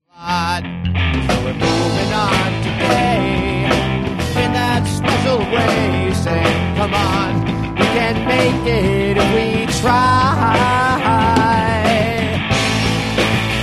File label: bells strings